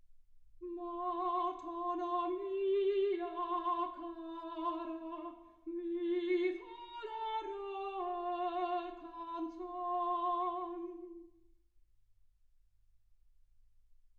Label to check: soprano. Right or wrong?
Right